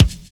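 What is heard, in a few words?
bass drum n shaker; Univers Sons - Kitch 70s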